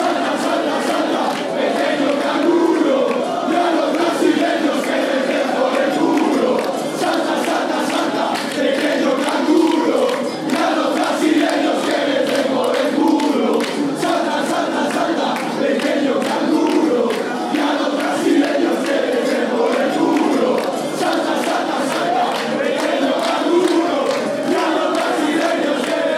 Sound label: salta pequeno canguro spain, fanchants